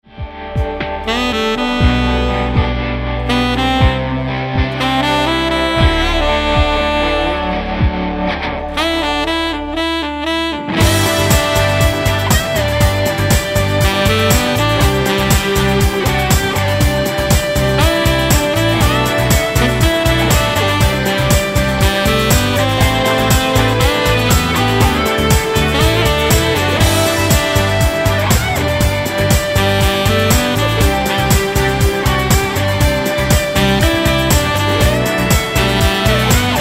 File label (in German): Alles, alles Gute! Instrumental